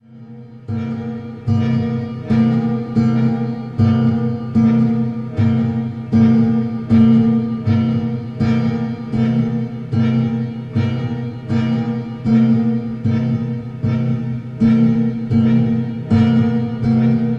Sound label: chord